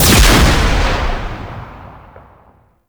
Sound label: Crewship Missile Launcher Shot; Warframe; RailJack; Grineer Crew Ship